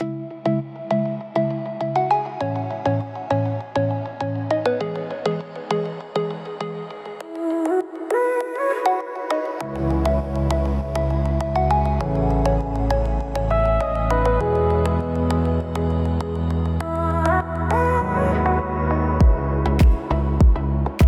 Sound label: ocean log, bgm